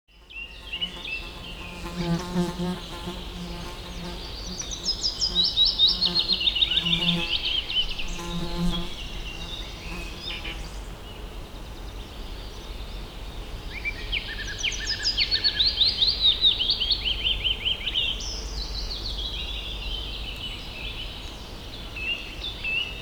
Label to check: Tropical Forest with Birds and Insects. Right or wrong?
Right